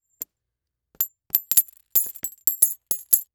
Impact Coins Multiple Coin Drop On Felt; Impacts

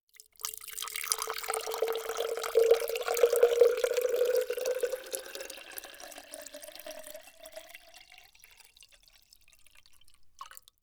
Liquid Water Water Pour Into Tall Glass

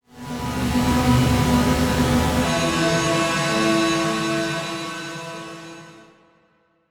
Production Element Title Transition Bed

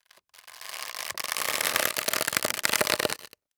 Cartoon Balloon Rub Crinkles; AdobeCC

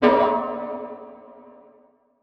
Transition Hit Impact Metal; Production Element

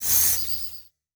Title Transition Tape Rewind Effect; Production Element

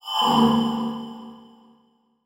Transition Metallic Swell

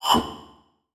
Multimedia Internet CD-Rom Flash Pop Up Short

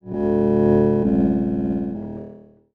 Buttons Mechanical; Multimedia Internet CD-Rom Flash

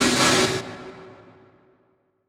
Transition Hit Impact; Adobe; Production Element

